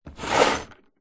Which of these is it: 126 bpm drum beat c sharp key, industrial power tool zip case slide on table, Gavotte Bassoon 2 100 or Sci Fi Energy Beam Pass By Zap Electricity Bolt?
industrial power tool zip case slide on table